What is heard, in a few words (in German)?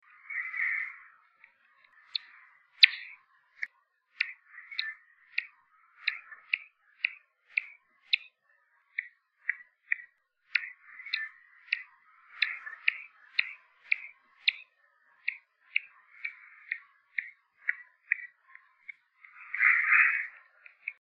fransenfledermaus, fledermausrufe; fledermäuse rufe